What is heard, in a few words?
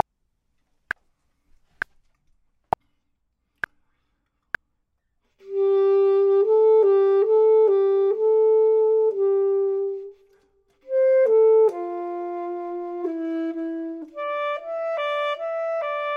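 top, Saxophone and Clarinet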